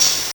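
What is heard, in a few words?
ChipShop GB Open hat; DRUMS; HATS; ChipShop Deluxe; ChipShop Hits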